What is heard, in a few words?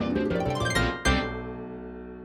Goal; sukeboooman; mobile